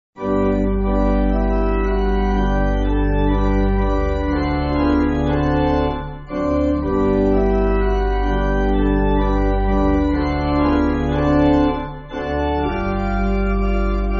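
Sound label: Pipe Organ, Music Backing; Beloit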